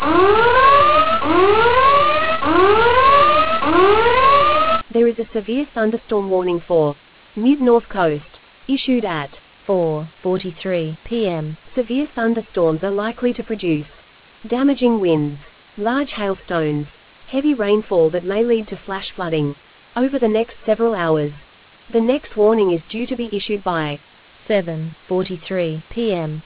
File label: storm audio